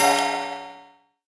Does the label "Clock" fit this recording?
Yes